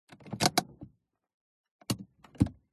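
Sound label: Citroen C2 Parking Brake, interior front center; MKH8040; PMSFX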